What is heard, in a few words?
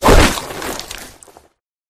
melee swish; flood